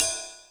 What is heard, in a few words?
Kawai Cymbal